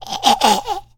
choke; survivor, voice

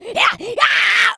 rage, infected, female

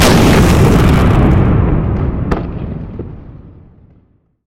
firewerks burst; atmosphere

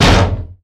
hit kick metal door; doors

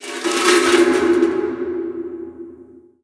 debris random, ambient

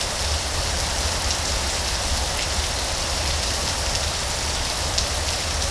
rumble rain no wind; ambient; weather

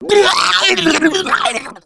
female boomer vomit; voice

